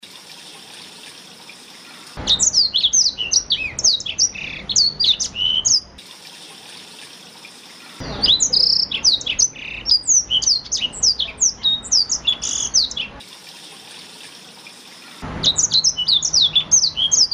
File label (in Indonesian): Suara Burung Blackthroat Gacor untuk Masteran